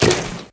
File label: impact; Smite; Vulcan